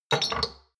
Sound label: shot glasses place multiple medium; berufe; gastronomie; soundpool